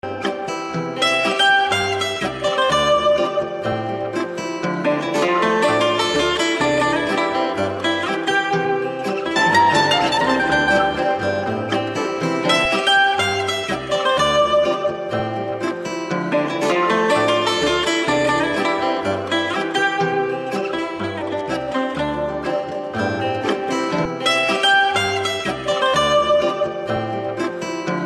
Guitar Ringtone

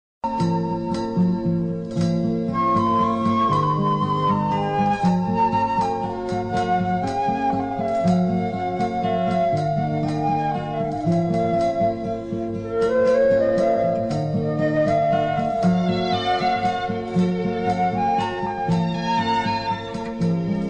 Emotional Music

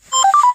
Formula 1

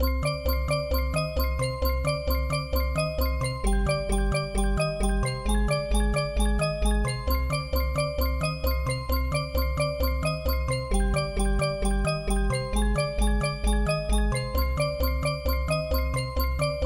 Scary Bells

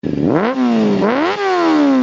Superbike Exhaust

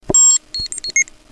Cycle Beep

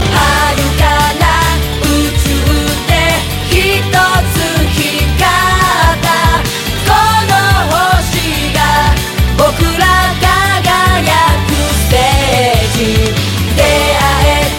Chorus Part

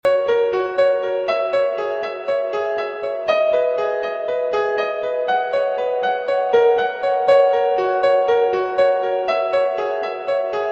Piano Lento

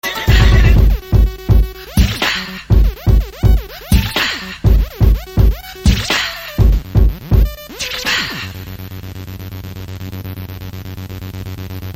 Beep

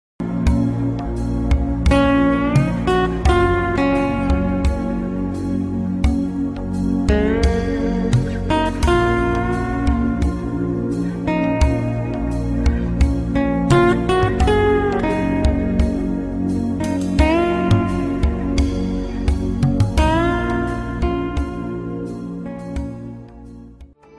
Slow Night Music

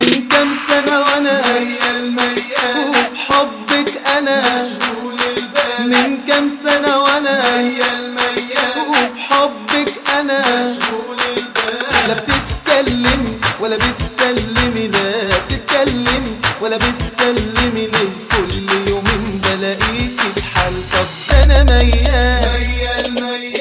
Arab Remix

